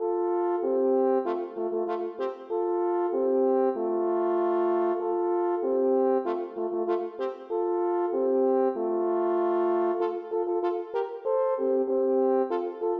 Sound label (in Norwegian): For to horn